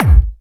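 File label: hard bass drums; Bazzdrumz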